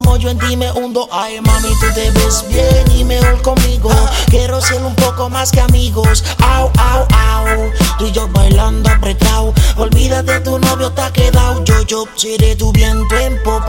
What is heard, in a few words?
Reggaeton